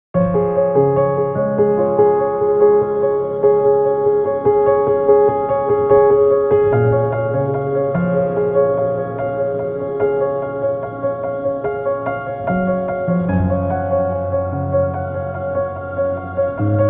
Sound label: Piano Theme